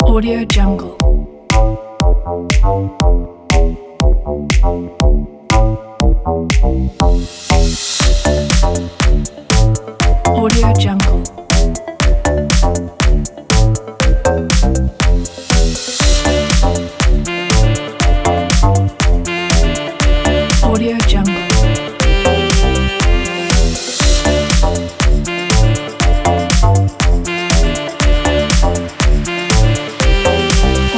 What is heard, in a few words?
fashion electronic